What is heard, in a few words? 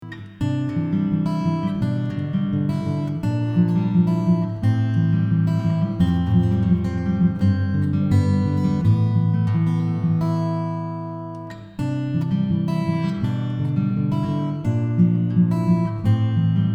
acoustic fingerpicking, guitar